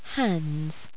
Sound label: hands; pairs